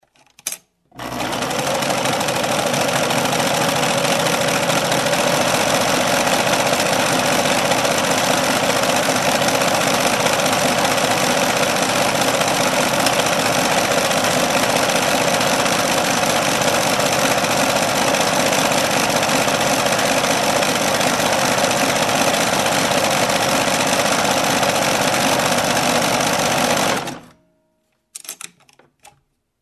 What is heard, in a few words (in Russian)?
mehanicheskaja shvejnaja mashinka